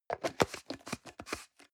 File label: plastic can small lid screw close medium